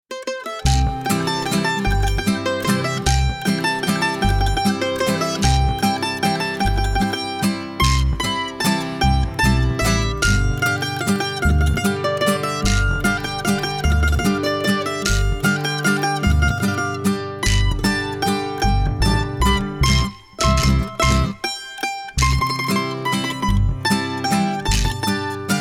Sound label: Music Vehicle Radio Events